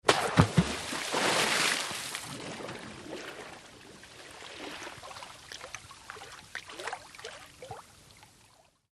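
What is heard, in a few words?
Splash Large Kerplunk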